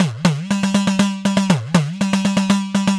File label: 3 4 Pattern